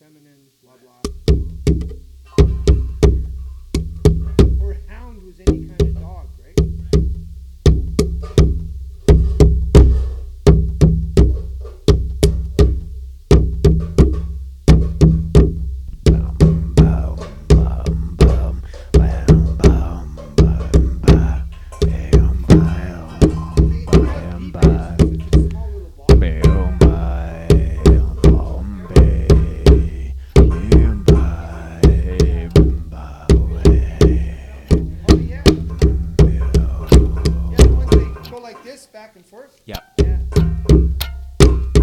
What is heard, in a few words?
drumming jam